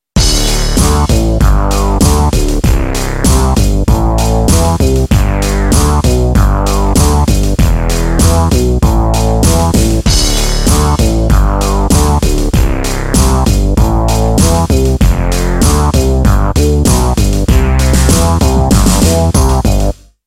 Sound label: Bass